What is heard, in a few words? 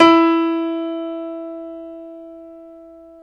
PNO Grand Pno; Club-50 - Foundations Roland